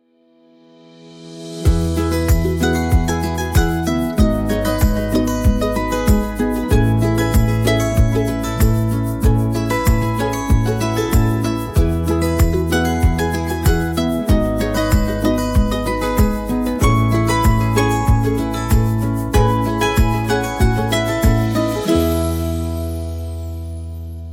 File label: gentle folk reflection